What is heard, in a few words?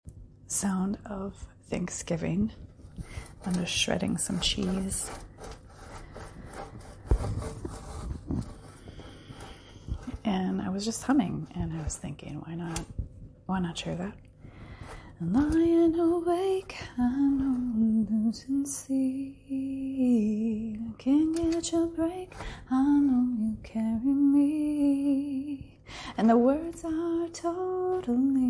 HUMMING; THANKSGIVING